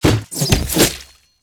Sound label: scatter Land second; netent